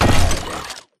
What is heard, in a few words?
footstep shuffle; Titanfall 2